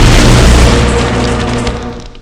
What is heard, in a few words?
portal out; svencoop; st allies; lowell